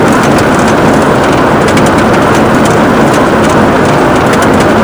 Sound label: gravel roll in, Kart Tires; AOS RF1 Kart Demo